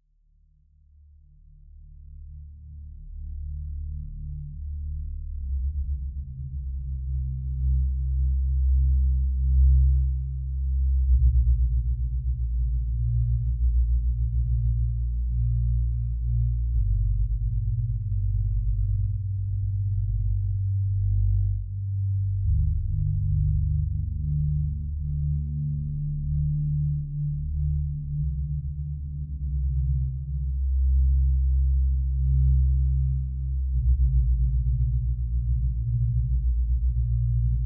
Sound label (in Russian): zvuk kolokolnogo nabata